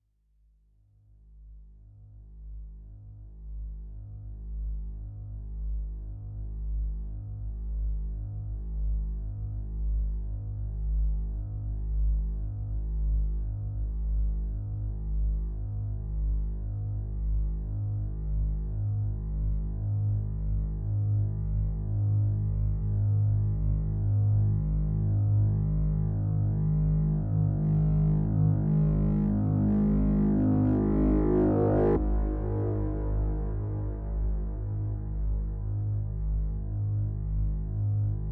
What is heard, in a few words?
noise